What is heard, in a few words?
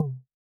Electro Kit Tom